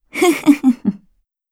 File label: comboburst